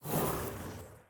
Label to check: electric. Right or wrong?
Wrong